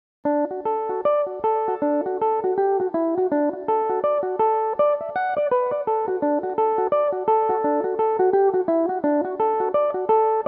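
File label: D major, mandolin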